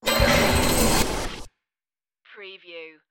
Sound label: wormhole transformation turn